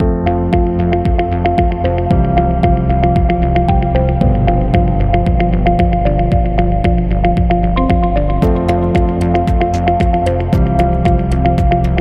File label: Elegance Positive, corporate music; corporate music vol 21